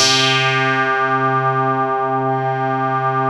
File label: PPG C3; Hollywood Edge - Giorgio Moroder Rare Synthesizer Collection; PPG.2.3